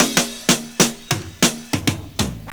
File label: FILLS, 70_s Breakbeats